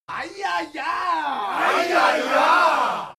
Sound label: native american shout